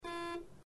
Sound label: red, Simon Game